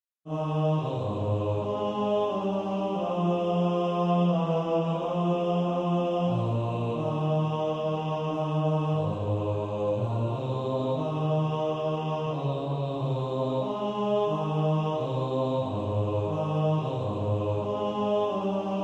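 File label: bass, practice music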